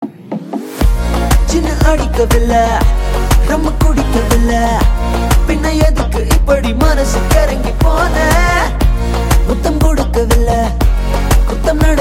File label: Love Bgm Ringtone